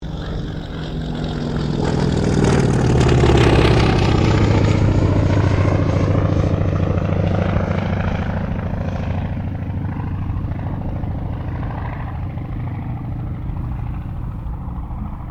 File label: AIRCRAFT CAC WIRRAWAY PASS; CA-16 Wirraway; Airplane; Airplanes; Vehicles